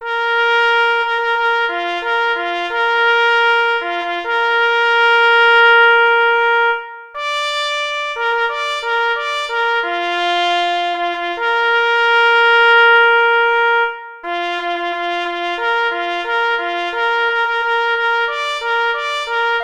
Fanfara ples solo; M. Lesniczak; Fanfara na czesc Diany Wschowskiej

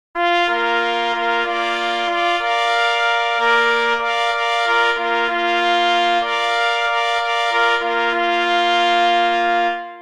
Fanfara, na 3 plesy; oprac. M. Kaminski; Darz Bor

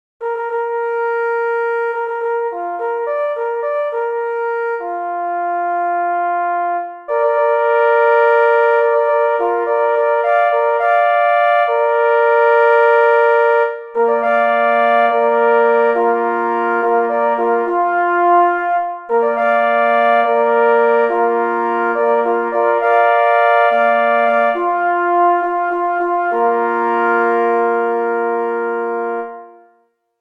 Hejnal, na 3 plesy; K.E. Kroskowski; Hejnal KL Knieja w Tczewie

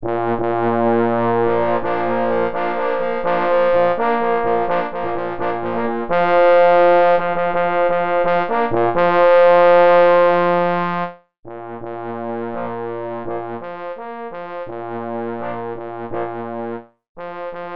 Fanfara na 4 parforsy; A. Kanawka; Fanfara dla Zubra

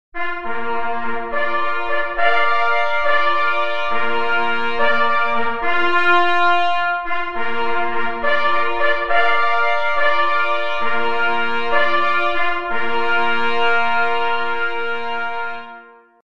Hejnal na 3 plesy; Hejnal Kola Lowieckiego GLUSZEC w Kartuzach

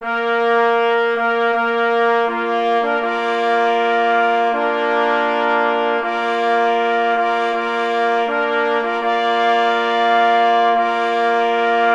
na 2 plesy i 2 parforsy; Na chwale munsterlanderow; pies w muzyce lowieckiej dodatek

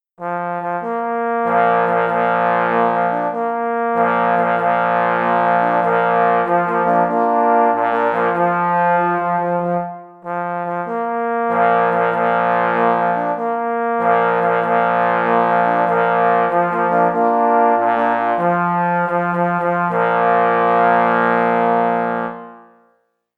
Hejnal na 3 parforsy; Hejnal PZL

